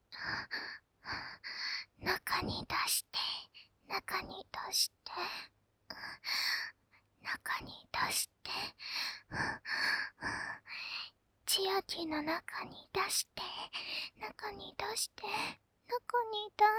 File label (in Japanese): 中に出して 喘ぎ; ハーレムオナサポ 4人のメイドがご主人様のオナニーをお手伝いするバイノーラル～文、千曜、名月、莉桜～